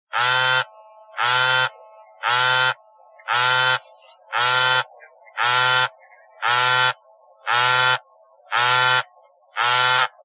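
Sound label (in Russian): повторяющаяся вибрация телефонной трубки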